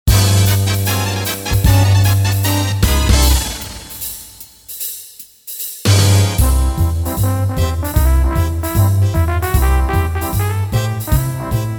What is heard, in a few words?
Big Band, Casio CTK-601 Rhythms